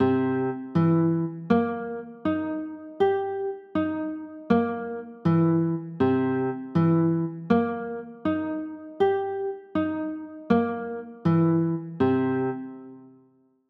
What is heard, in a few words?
c sharp 6 9, chords; c 7 sharp 9